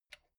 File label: metal button stereo spring release